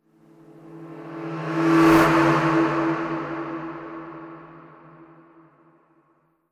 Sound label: Transition Horror Whoosh Slash Scary Metallic, Transition Metallic Whoosh Slash Scary Airy; Slow Horror; Sound Design; Whooshes; BBFX